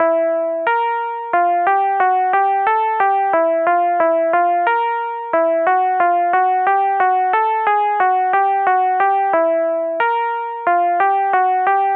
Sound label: spooky